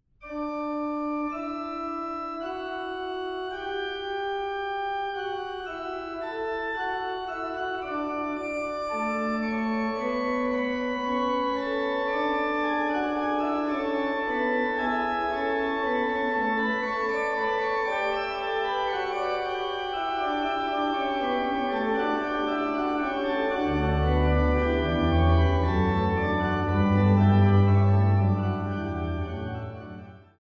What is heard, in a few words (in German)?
Fuge C Dur; J. S. Bach; BWV 545